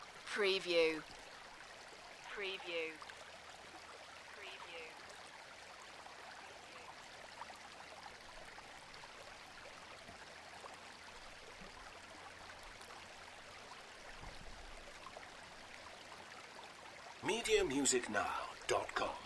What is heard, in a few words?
Stream into the lake long